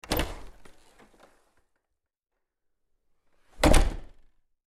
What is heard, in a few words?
door open close; A succubus is born